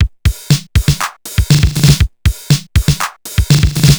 TR 505 (120BPM), beats; musicradar; cyborg beats

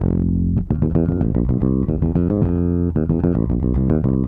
Raw Funk Bass; Sonic Foundry (Sony Creative Software); What it Is! 70s Analog Funk

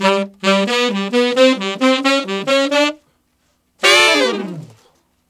Sax Section in G, Jazz; Traditional; Sonic Foundry (Sony Creative Software); Jazz Solos and Sections

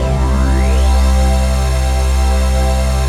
PAD, Dance Mega Synth

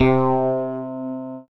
STRANGE BASS; Masterbits; Dance Trax

